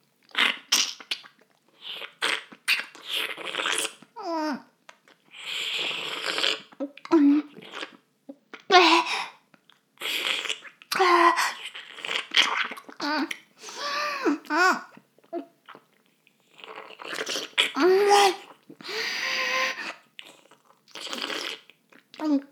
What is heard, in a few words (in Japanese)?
フェラ音声; 少佐殿; 牧場戦腺